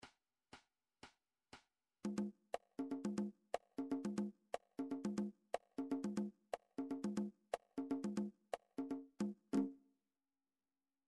midi drum styles toolbox conga; short themes

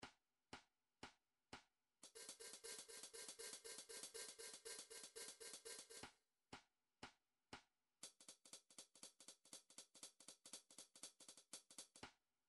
drum trax hats

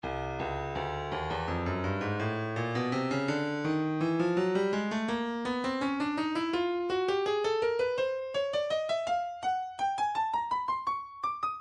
breakbeat midi files 080 084 bpm; Breakers B; Stylus Breakbeat Midi Files

